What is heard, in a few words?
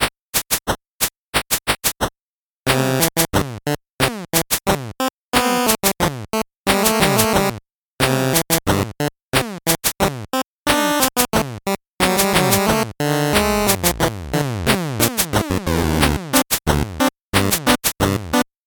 8bit bgm fast